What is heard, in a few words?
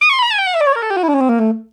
FST SCALE DN, SOPRNO SX